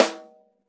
SD2 -L, Drums Acoustic; AKAI; 90s sample CD; USB Soundscan vol.10; RAW KIT 6

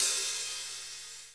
HAT SLOSH; 90s sample CD

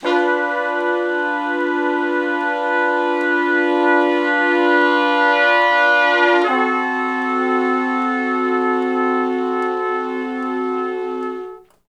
Slo Blues Chords [IV, IV, I ,I] (D); Sections; Crimson Blue and Fabulous Horncraft 4 RnB; Horncraft for R&B

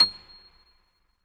MONO, Acoustic Piano

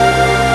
Dance Chord Csus; Proteus 2000; Multisamples; Digital Sound Factory Proteus 2000

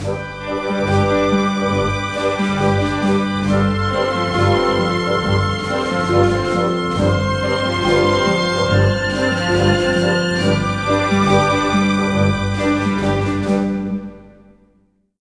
BOLE, ORC Bolero; East West - Scoring Tools

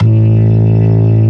B3 Lo Slow A0; Proteus 2000; Multisamples; Digital Sound Factory Proteus 2000

